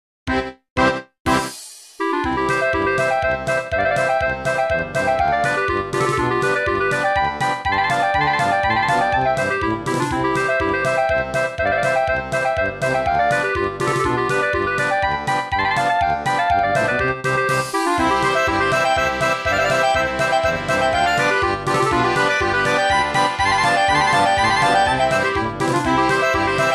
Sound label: clarinet polka midi